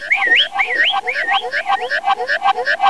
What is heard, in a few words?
house; TigerMountain WK2.1